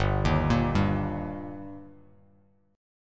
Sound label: G Minor Sharp 5th G Bass Standard, chord; arpeggiated; JGuitar